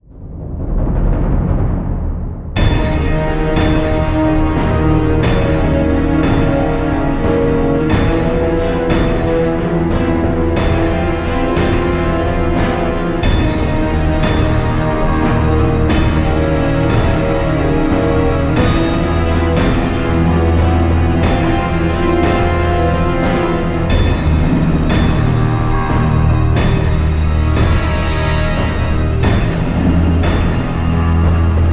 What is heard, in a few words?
Dramatic